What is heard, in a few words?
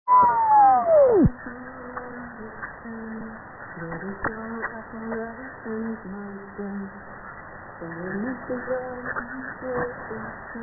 HAARP 9450; Khabarovsk